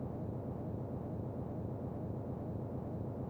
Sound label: moving